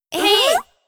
fs Rise Arms; netent

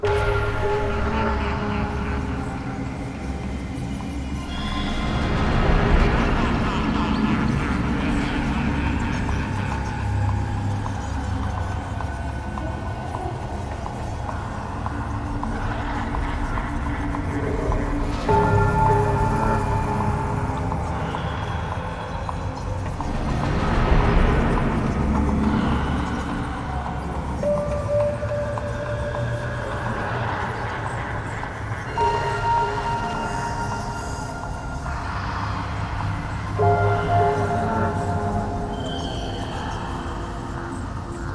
outro Free Spin Loop

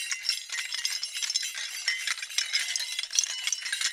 Reel Spin Loop

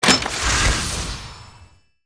crab Chest Transformation; netent

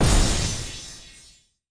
fs 3X Land; netent

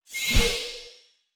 text away; netent

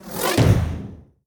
win sign smash; netent

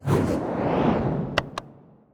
plus one; netent

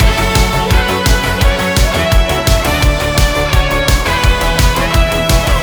big win loop; netent

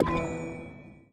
Spinbutton; netent

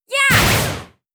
Light Attack; netent

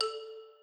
paytable button; netent

